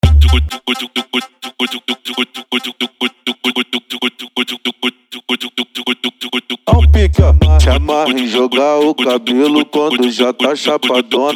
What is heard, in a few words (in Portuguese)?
funk mega bass e noia dance